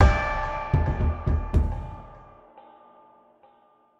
Boss Defeat Stinger

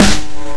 Snare, G2; Guitar Rock Tour

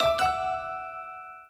Sting Positive

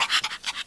Pant; Melonbra; Fifi

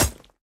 Weapon Spear

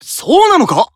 Emo Surprise; Disney Star Smash; Android, iOS, Mobile

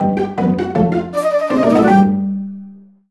Lose; Pokémon Mystery Dungeon Gates to Infinity; 3DS; V-Wheel Lose

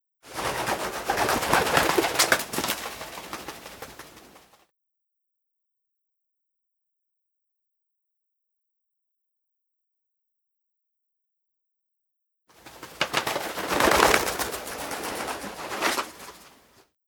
Vaticancity Pigeon

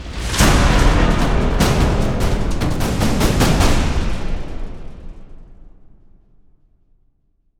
Stinger Fill; extinction deluxe edition; ps4 windows xbox one

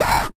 Goblin; Going Under

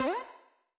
Bouncy Bubble Bounce; subway surfers city sound effects; Android, iOS

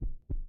Heartbeat; lethal company

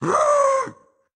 Dash Wind Up; Caffiend Caelan

